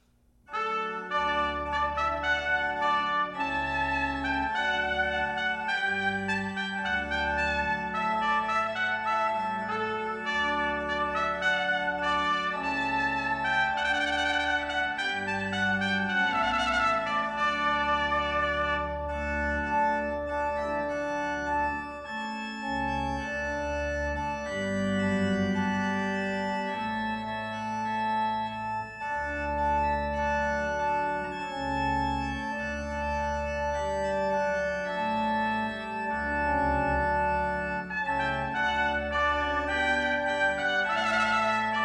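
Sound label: Te Deum with organ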